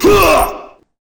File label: kick swing; svencoop; rngremake